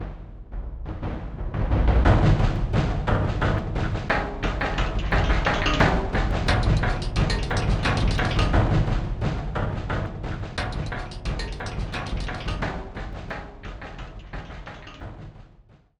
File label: BGM Combat; District 3